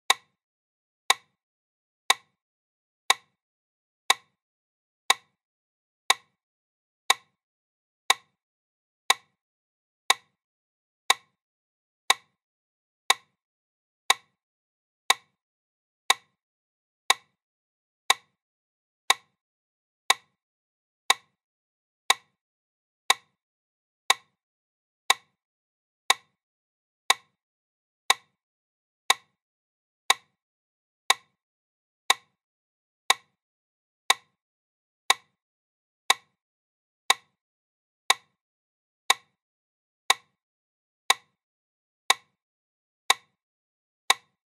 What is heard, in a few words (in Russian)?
zvuk metronoma 60 udarov; для минуты молчания